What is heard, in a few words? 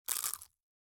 eat; inventory